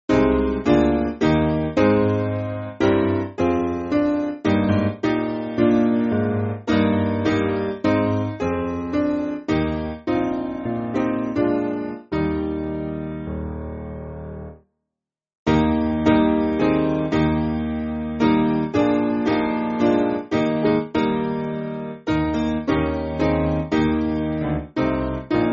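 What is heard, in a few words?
Piano, Backing Files for Churches; Bread Of The World; Eucharistic Hymn; Eb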